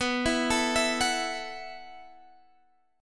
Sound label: B 7th Suspended 4th B down Guitar Half Step Down